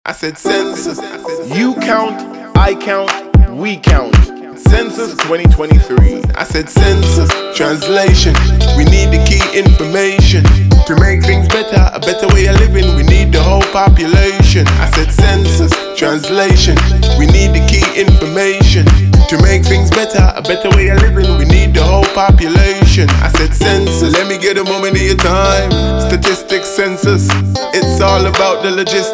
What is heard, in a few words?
Census Jingle; Census 2023